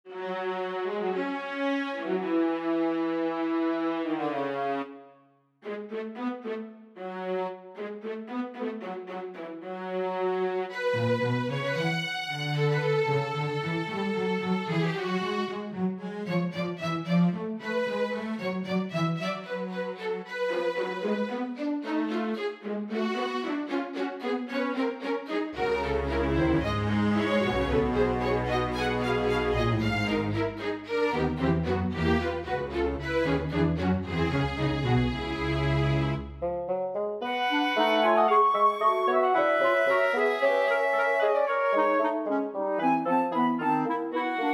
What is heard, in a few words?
Fugue